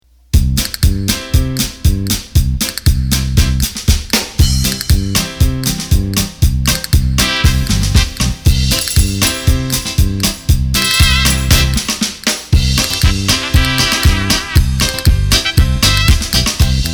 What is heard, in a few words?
Passo Double, roland bk7m